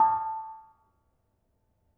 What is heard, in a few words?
Bonang Panerus, gamelan